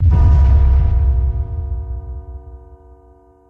defeat; grim fall

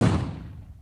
missle explode; furious road

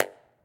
start drop; draw pixels

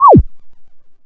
move cursor; tap touch run

